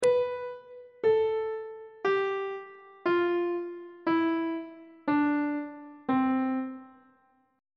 d double flat major scale descending on treble clef